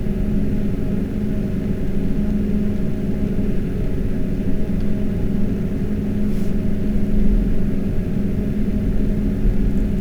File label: Max RPM